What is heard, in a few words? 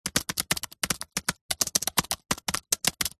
computer keyboard apple mac magic typing short sequence